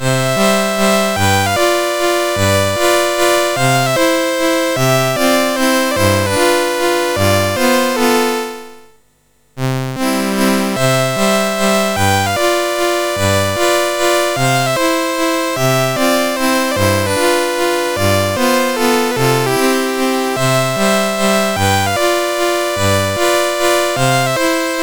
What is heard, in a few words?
MOS6581; MOS6581R4